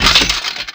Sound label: weapon rack; Fate The Traitor Soul; ITEMS